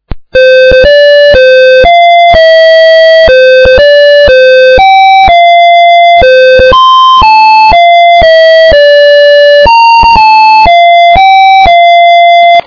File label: happy birthday to you